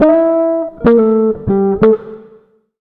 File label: jazz, acoustic guitar loops; USB Soundscan vol.04 - Electric & Acoustic Guitar Loops; AKAI